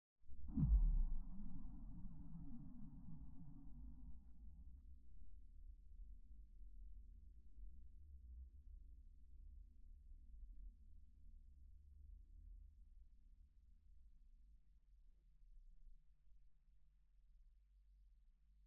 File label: crunch woomph